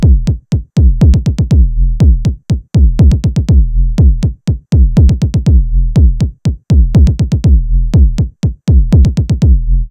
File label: Knobula Kickain Mono Loop Acc